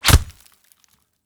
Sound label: knife hit; arccw go